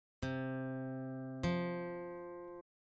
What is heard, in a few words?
interval perfect fourth, guitar